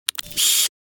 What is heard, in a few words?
Camera point shoot zoom focus